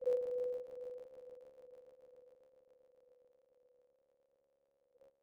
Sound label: E7 sharp 9 b